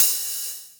Hat, Ensoniq ASR-X

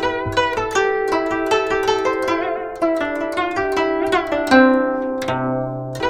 GUCHIN 90 C; INIS - Opium

